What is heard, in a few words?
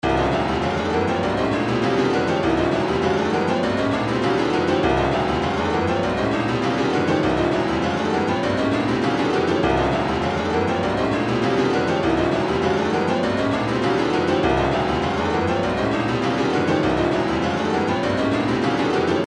retro funk, midi; money